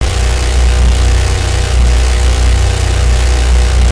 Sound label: machine gun loop; gow; silverback